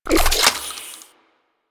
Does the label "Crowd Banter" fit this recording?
No